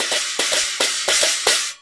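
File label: CHINA LG14 R, China cymbal; Spectrasonics; Heart of Asia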